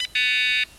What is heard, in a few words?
error, Curtis Electronic Code Switch; buzzer